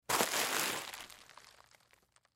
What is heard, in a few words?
Bubble Wrapped, Bubble Wrapped Object Remove From Package; Plastic; Foley; Blastwave